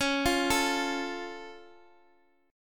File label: Db Augmented Db down Guitar Half Step Down